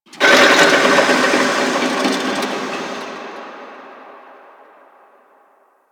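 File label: Shipyards Distant Machine One Off, Ambience; Warframe; Grineer Shipyards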